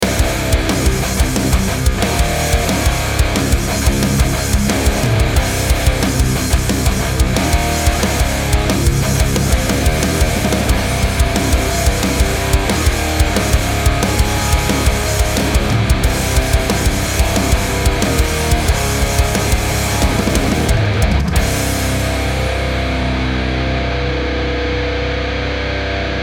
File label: metal groove 90 bpm short